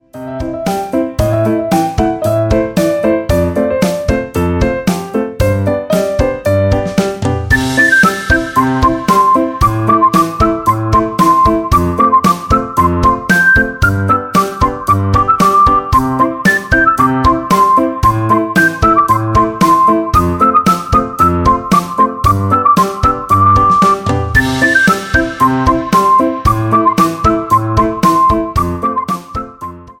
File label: Fun Rhythm; MIUI12